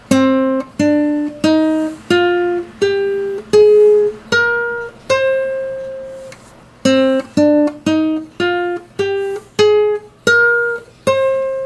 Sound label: minor scale, classical guitar